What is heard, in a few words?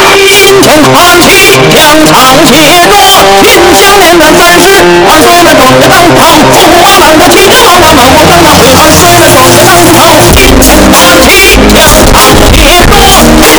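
chinese singing really loud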